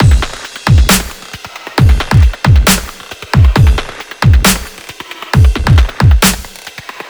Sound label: Table Kit; Барабаны